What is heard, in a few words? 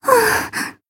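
Voice damaged, Japanese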